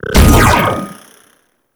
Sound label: Bubble Shield Deactivate; Warframe; Captain Vor